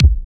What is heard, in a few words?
DR. LOOP, DRUMS; X-Static Goldmine 3